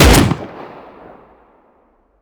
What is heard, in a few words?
waterpipe shotgun attack; Rust